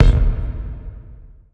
Menu Basketball; Menu FX

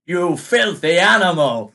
LOSE; Pitch Black Audio Pong

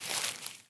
grass step, footsteps

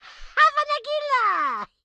chopper hit success; Blind Drive

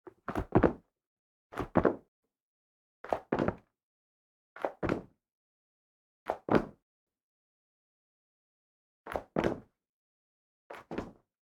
carpet jump, footsteps; NS Studios - ultra sound collection

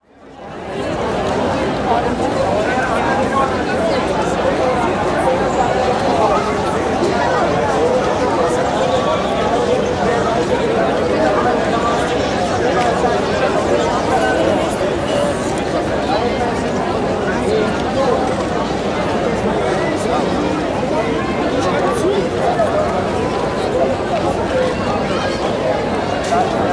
Crowd Outdoor Busy City Square, Walla; Sound Ideas; Walla Series

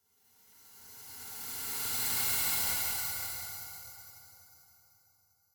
Reversed Tone Eerie Ring Harsh Passing Tones; Hollywood Edge; sound designer's toolkit v2

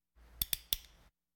Hospital effects

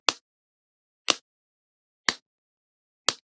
Generic Button Wood Small

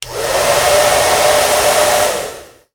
Blow Dryer, Bathroom; 1001 Sound Effects

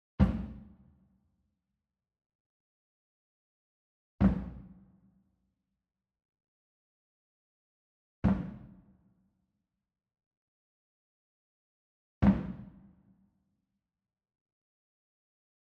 Small Drum Tabla Flam Soft Beater Medium; boom library; Cinematic Strikes Construction Kit

